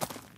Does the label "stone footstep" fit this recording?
Yes